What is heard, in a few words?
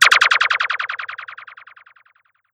Old Dub